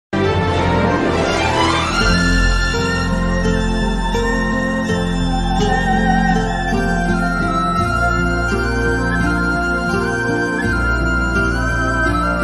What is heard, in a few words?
beautiful flute ringtone, sad flute instrumental ringtone